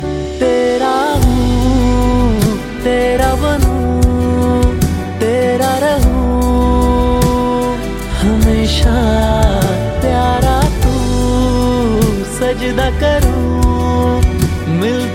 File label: bollywood ringtone